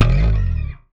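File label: slap, bass; Xsample VOL-1; SLAP+THUMB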